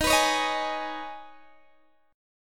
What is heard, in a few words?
D sharp 7th Suspended 4th Sharp 5th D sharp, Guitar, Open D